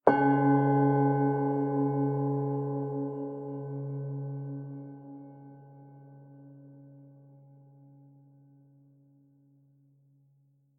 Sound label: Meditation Bell